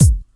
00s dance hall kickdrum a key, Royality free bass drum sample tuned to the A note